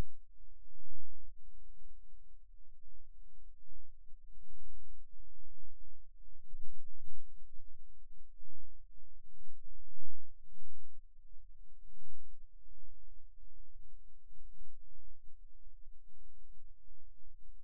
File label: Rhythm Wave, eeg seizures